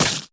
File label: Pie Splatch